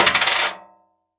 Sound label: Coin On Metal Tray; Coins